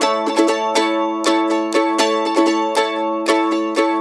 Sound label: Blues Guitar Samples, RIFF; ILIO; Fretworks; CEE RIFF